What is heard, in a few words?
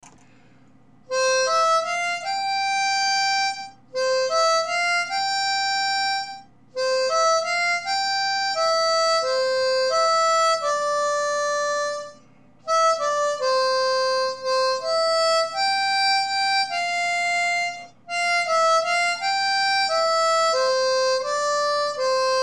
Saints Whole melody